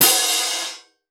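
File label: 20 PIATTI, CYMBAL CRASHES; Voice Crystal - Steve Reid Definitive Percussion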